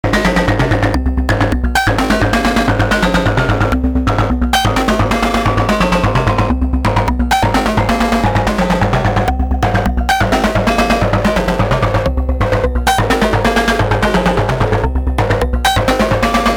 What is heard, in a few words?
rsf, modular; modular planet